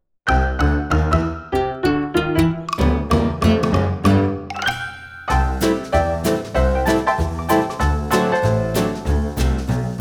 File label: Happy Old Timey Piano